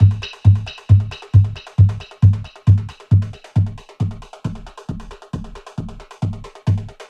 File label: House; Best Service ProSamples vol.10 - House; AKAI